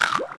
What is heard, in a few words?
bite; Feeding Frenzy